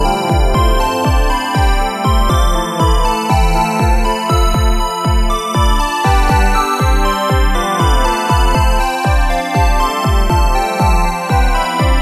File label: Beautiful Ringtone